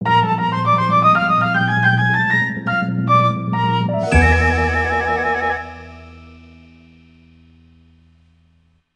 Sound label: Bari Sax